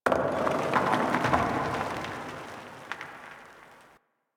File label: Landscape Rock Slide Low, Ambience; Warframe; Venus Open World; One Offs